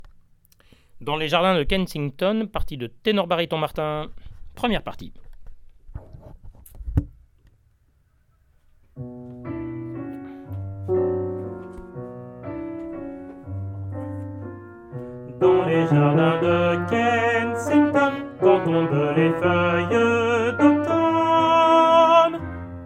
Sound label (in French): Tenor